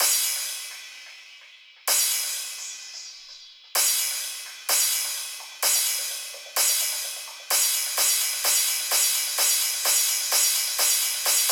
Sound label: 128BPM, House Electro Fills; Spaceman 2; 8Bars; Vengeance Samples